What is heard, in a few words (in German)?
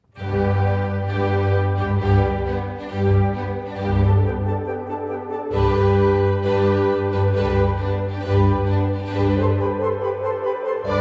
Sinfonie Nr. 11 G Dur Marcia Allegro con spirit; CC Agthe